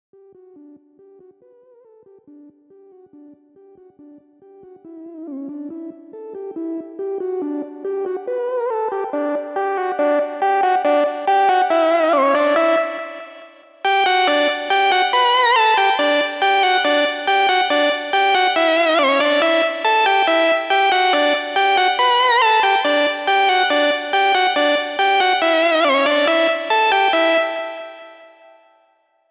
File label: 140 BPM Intro Melody, Synth, Root E; Vengeance - Essential Clubsounds Vol.5; Vengeance Intro Kit